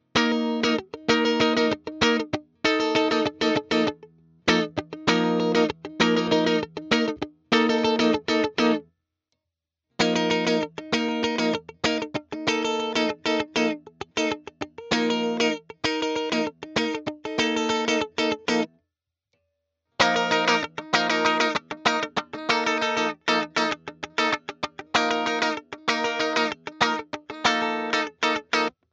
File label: Funk Chords; VOX; Fender